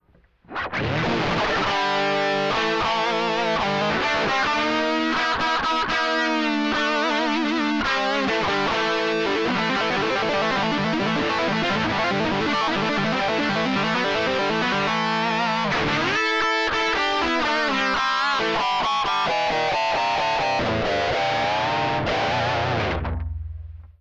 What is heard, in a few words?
pitch; tc electronic nova system